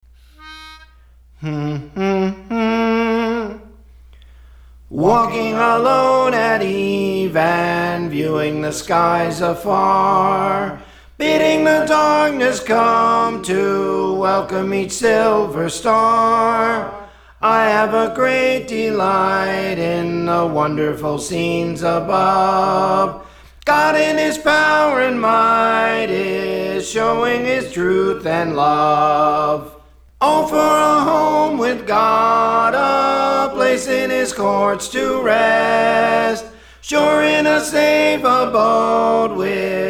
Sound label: Soprano; Praise For The Lord Training